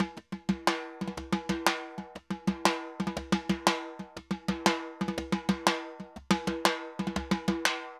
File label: Timba, Festa Latina, Drums & Percussion; Best Service; Festa Latina VOL-1